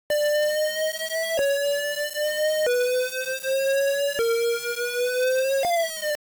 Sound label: BOMB CAR; WarioWare Inc